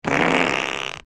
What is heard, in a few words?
fart